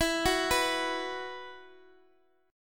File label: E Suspended 2nd, Guitar Open G; x,x,x,9,7,9